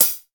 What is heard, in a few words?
airy hi hat one shot a key